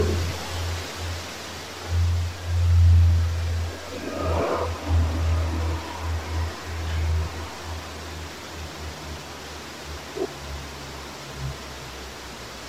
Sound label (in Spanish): ruidos inframundo